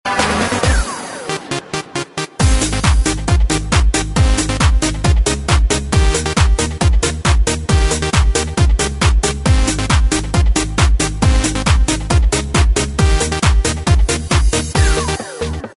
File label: tv commercial tune ringtone; six flags